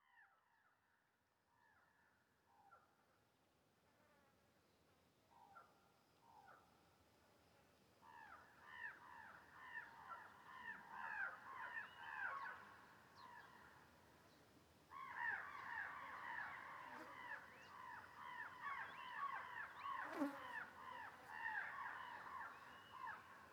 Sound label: amb generic outside day